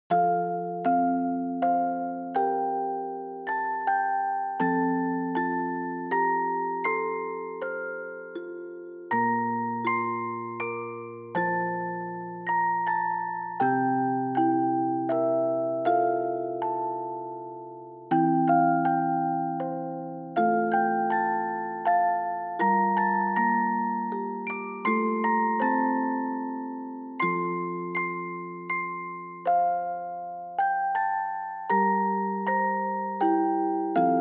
Reverb Slow; bgm

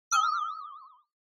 Negative High Short; Onoma; オノマトペ ネガティブ; 高 短

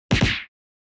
Hit

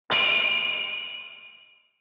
Retro Anime Accent High Short

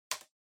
PC-Keyboard (Far-Space-Hard)

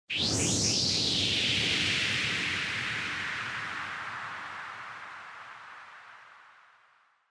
Motion Speed Lines High Long; モーション スピード線05-4(高 長)

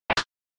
RPG Chest; SNES

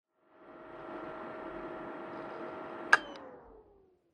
PC Operating Noise Forced Shutdown

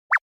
Anime Motion High; アニメモーション

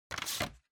Time Card Rack Take Out

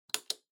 Stopwatch Mechanical Stop Table

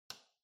Light Switch Box Rocker, Hall Gentle

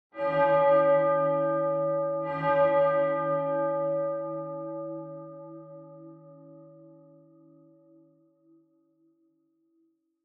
Church Bell Far Low Mid

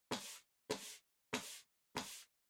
PC Mouse Move Far Soft Pad Hit

